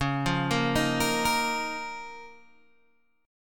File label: C sharp 6th Add 9th C sharp down Guitar Open G